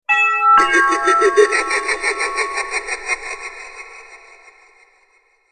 Tiếng chuông cửa halloween kinh dị

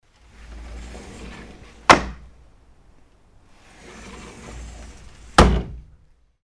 Tieng dong mo cua so keo

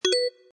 am thanh lua chon dung

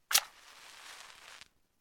Tieng quet que diem

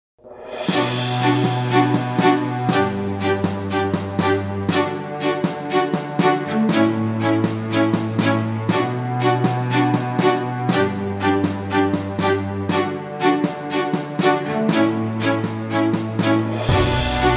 Nhac nen quang cao doanh nghiep

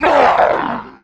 het gam ru cua thay ma truoc khi chet

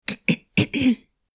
tieng am u o co khi co dom o co bi ho

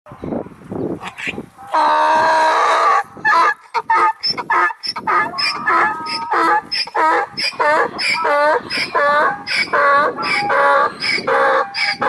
Tiếng lửa kêu

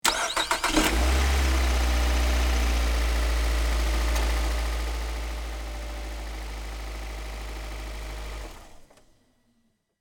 dong co khi khoi dong xe

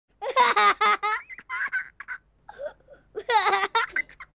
Tiếng cậu bé cười

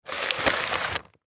Tieng vay vo cha xat to giay bao

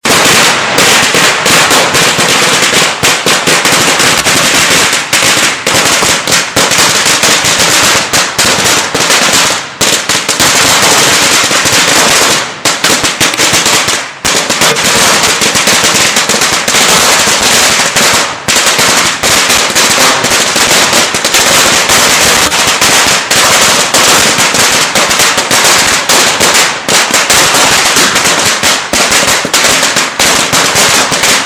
tieng phao duoi chim

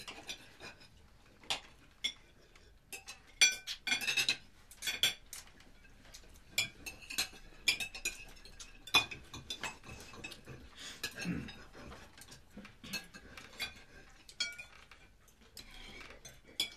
Tieng nhom nguoi dang an trong moi truong yen tinh

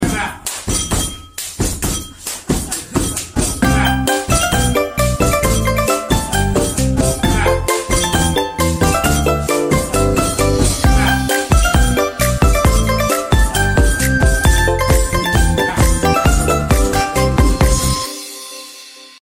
Nhạc chuông chúc Tết

